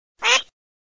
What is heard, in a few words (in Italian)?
alzavola, birdsound